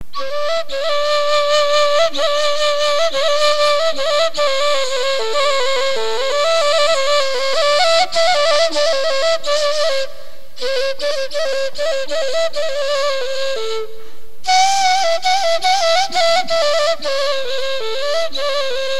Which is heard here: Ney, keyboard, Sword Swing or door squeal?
Ney